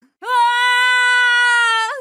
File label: fall scream; mk9; sonya blade